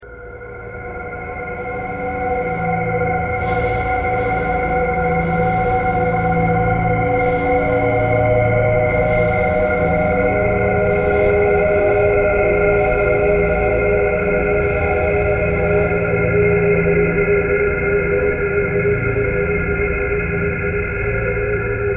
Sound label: traffic kcore birds a traffic kcore birds a thread simple; bowedmetal